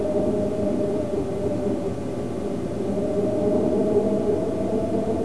winds; Brickshelf; kowahi

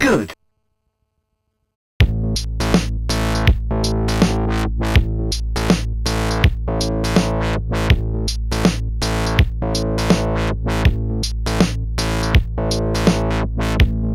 nxt synth

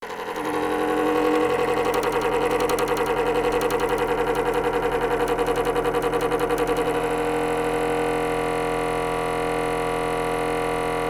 sound laptop cooling fan makes now broken

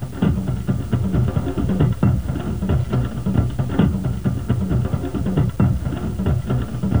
battle music; bionicle

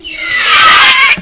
screech near

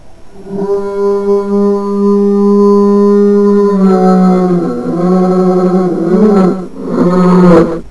cave spider

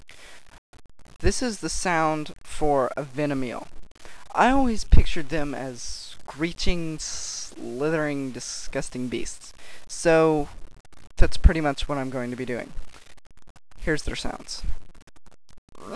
venom eel sounds; Bionicle

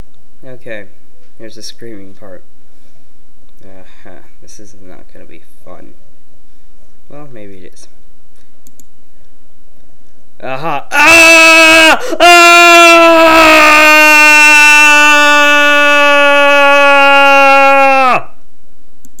scream

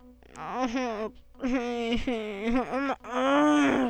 groan

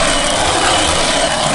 grind metal

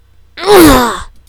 mwah

